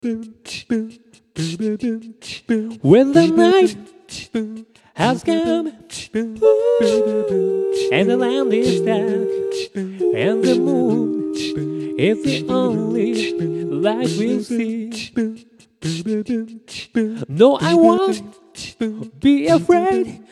phrase loop

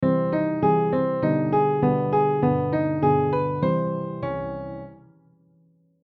scale melody bad

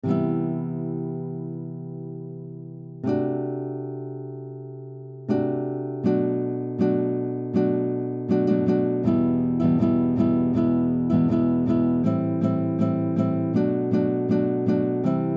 backing guitar

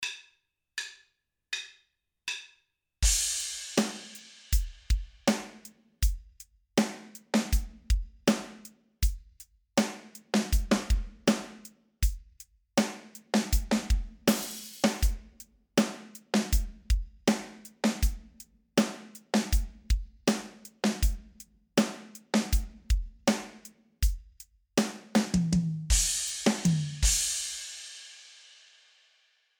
drum

